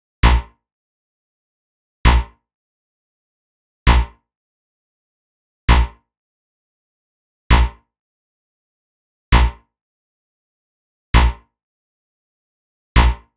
132 Em Bass, Bpm132, Complex Electro Synths; Prime Loops; Ossify

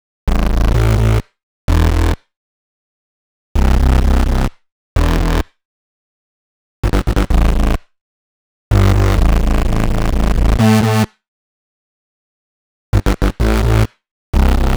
128 Am Bass, Complex Electro Synths; Prime Loops; Speakerbox

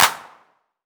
Claps & Snares

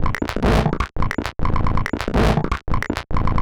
140 FX LOOPS

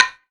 Percussive

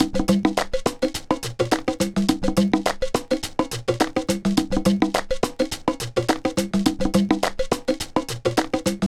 105 Bongo, Breaks, 105 BPM; loops; Loopmasters; Jon Carter & Alex Blanco; Block Party Essentials

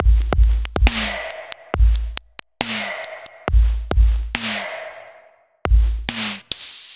Bpm138 Break, dubstep drum loops; Kaleidoscope

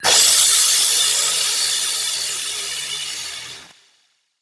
Crash, Dubstep One-Shot Drums

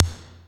PERC; Loopmasters; The New Sound Of Dubstep; DKD DRUM HITS

